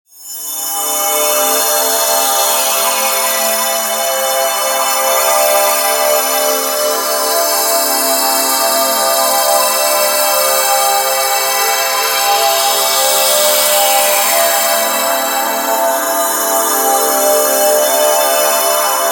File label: Bed Composition Choir Synth Blips Glitches; 5.1 STEREO MIX; Blastwave